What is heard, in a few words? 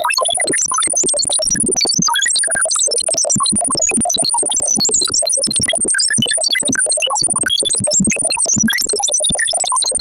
computer alien arpeggio